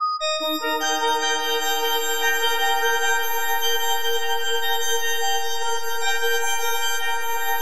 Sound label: D# MAJ, CHORDAL; Bolder Sounds